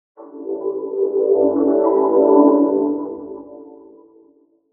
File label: in Secret, Vocoder